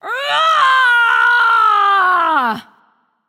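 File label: battlecry, female; smite; voicepack; hera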